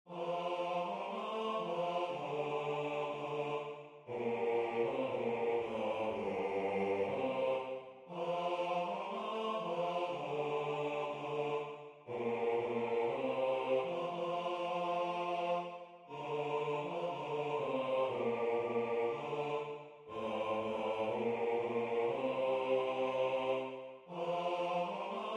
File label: bass; In The Bleak Midwinter; Waiheke Island Choral Society